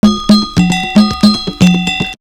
Percussion; AMG - Coldcut's Kleptomania VOL-1

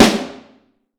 Vintage Snare Drum

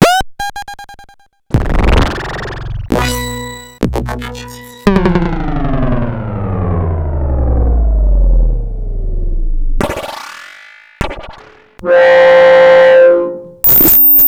Beeps and Noise

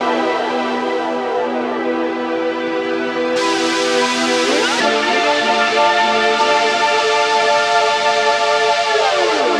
100 E Synth Tokyo Station; Zero-G; Future Wave

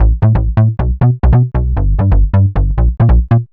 Bass 136A, uk garage 136bpm

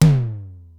Simmons SDS-9 drum machine

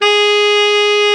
TENOR, Tenor Sax; Tenor Sax 1

